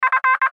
Radio Morse Code; Morse Code Letter F; Morse Code (Sound Effects:Electronics); Blastwave; Warfare

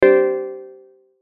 CHIME SOFT; Blastwave

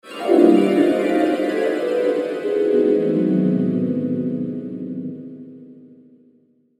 Shimmer Harp Reverb Delay; String; Blastwave

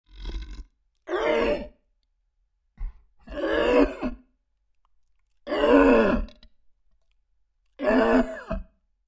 Creature Large Gasps Inhale; Scary Breathing; Horror; Big Room Sound

